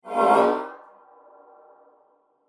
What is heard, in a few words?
Future Vroom, whoosh sound effect; Future Vroom 15